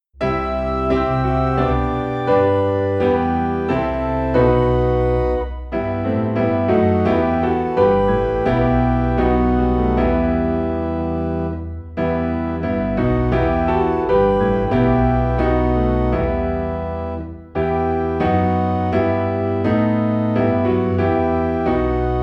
Piano and Organ in E Minor; Jesus Lover of my Soul

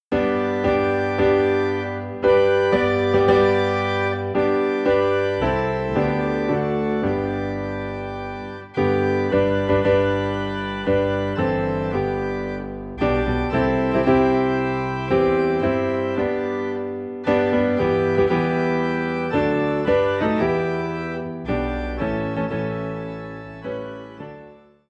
Piano and Organ - High in G; Victory In Jesus

